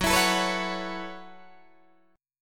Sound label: Gb Minor 11th Gb Guitar Open D